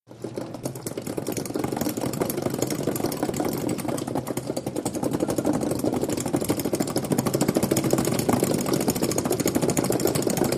HOSPITAL EQUIPMENT HOSPITAL: Gurney cart, constant run, close to wheels, faster.